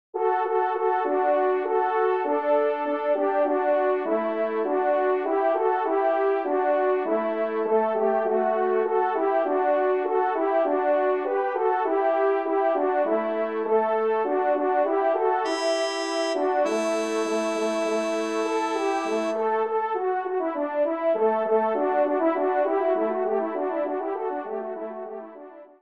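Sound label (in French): ouverture, Do majeur, ensemble, trompe cors; Corrette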